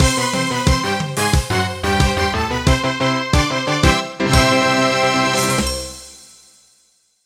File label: Win; Rescuepearl